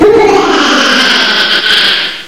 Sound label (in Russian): zhutkii smeh odinochnii rezkii shumnii muzhskoi